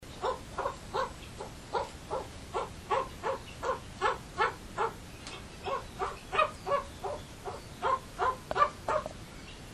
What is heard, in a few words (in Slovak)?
bazant obycajny